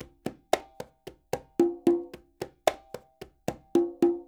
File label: NYC Percussionworks loops; Sampleheads